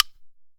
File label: WOODSTICK; Best Service; World Colours